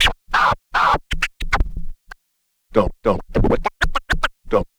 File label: Scratch